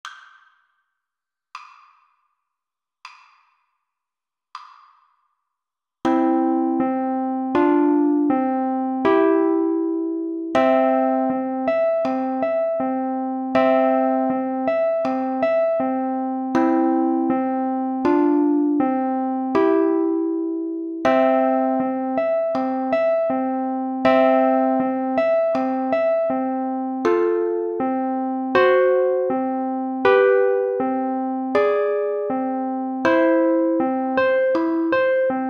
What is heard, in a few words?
40bpm, uke